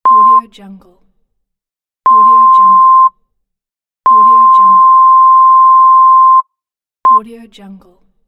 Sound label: censorship beep